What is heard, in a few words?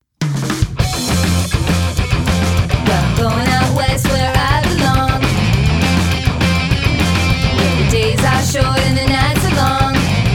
Vocal, Music